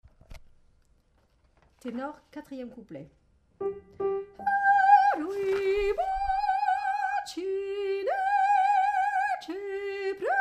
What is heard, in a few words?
Tenor